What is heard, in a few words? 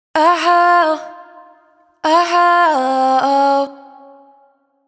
riff Ah Huh 128 Fm wet, Vocal; Slate Digital Omnivox Vocal Sample Pack